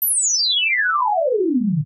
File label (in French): gamme electro desc